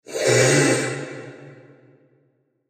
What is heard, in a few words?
Short Diffuse Transition Breathy; Scary Tone; Horror